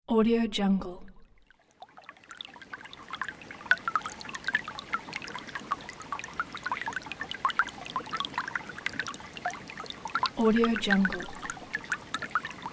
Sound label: small water stream